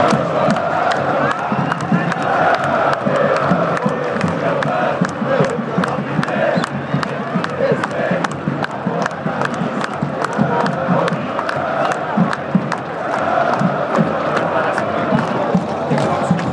fans chanting for an injured player

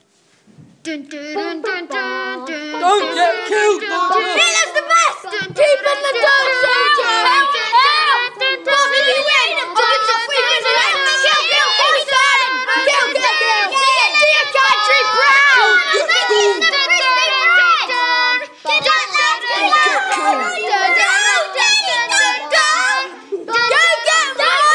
crowd; rose blanche

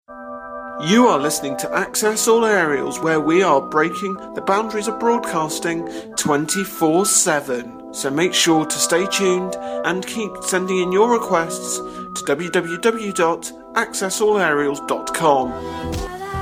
jingle

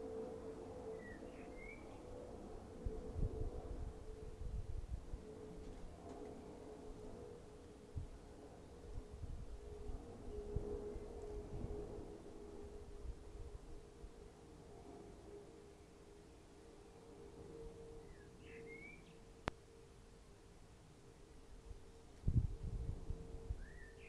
noises from the new garden

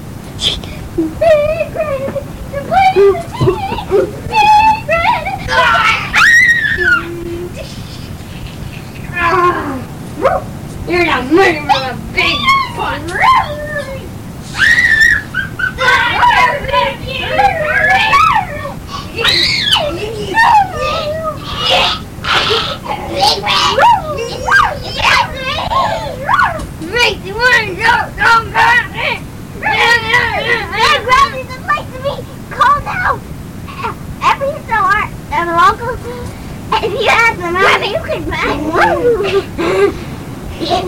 jingle; superior big red

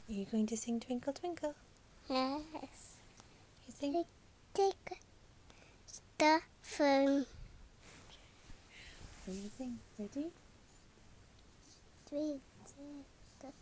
twinkle twinkle little star

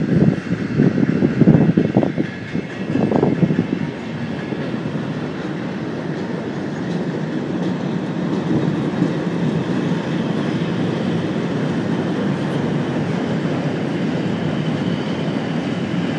train sound; graffiti

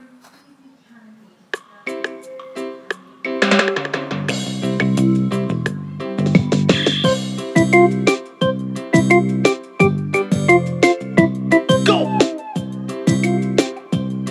reggae beatz

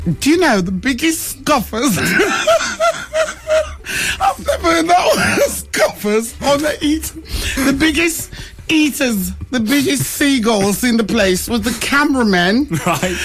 laugh; rusty lee

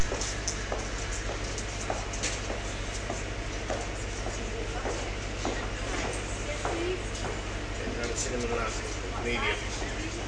coffee stand at train station; doncaster

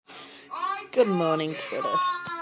background noise

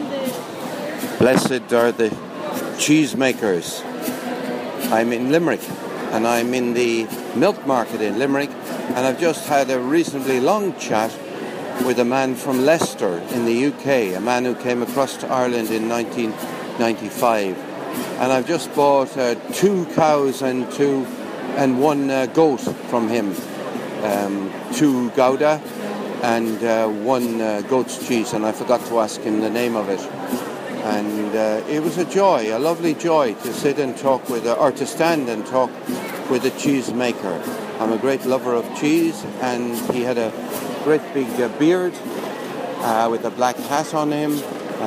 Milk Market Limerick raw busker

